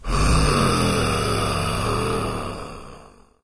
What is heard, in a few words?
idle breath; doom3; commando